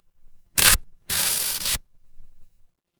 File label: paper fabric tear